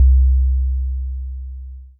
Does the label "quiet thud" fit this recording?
Yes